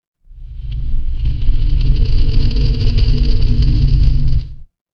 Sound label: magic scifi portal